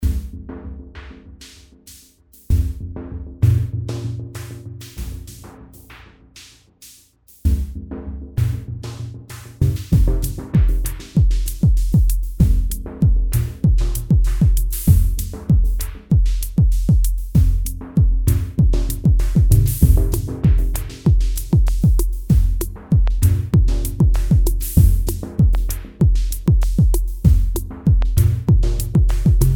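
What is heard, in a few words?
dub techno stab drum kit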